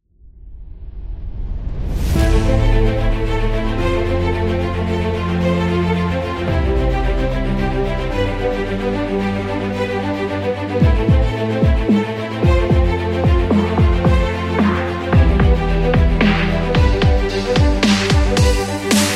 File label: Epic Dubstep Trailer; epic music vol 2